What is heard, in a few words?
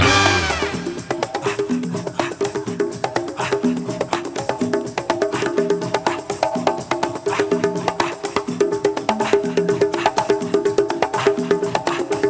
Percs Solo (Symphonic Version)